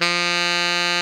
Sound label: TENOR MF F 3, Saxophones; Greytsounds; Philip Wolfe David Crozier's Saxophones